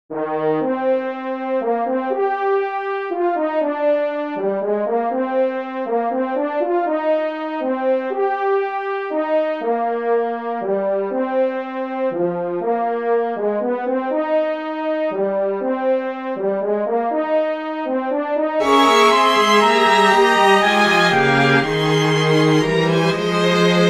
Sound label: OBOE, oboe concerto